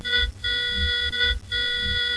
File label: Two Triple-Tone Alarm; Star Trek; TNG